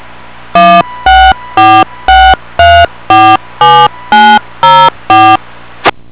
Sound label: dtmf 250 250; mot